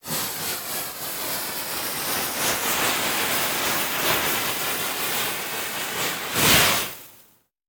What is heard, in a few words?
Fuse Black Powder; Sound Ideas; Guns